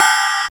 Soft Sounding Open Hi Hat G Key, Royality free open hat sample tuned to the G note.